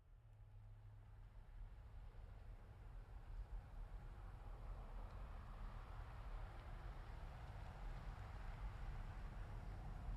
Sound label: Truck GMC Savana By Corner Slow; 2010 GMC Savana; Trucks; Vehicles